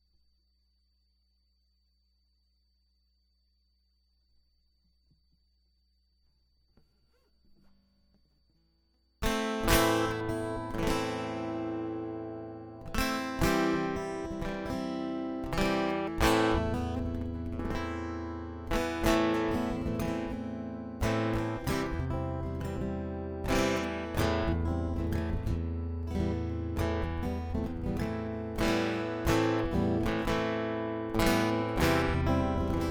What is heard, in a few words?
Guitar Loop